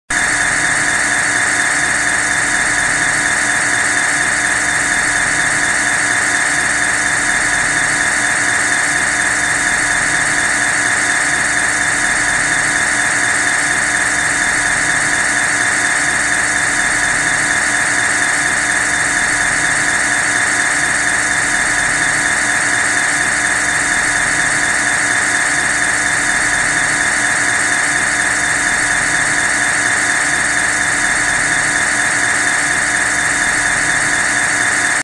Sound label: drill; bank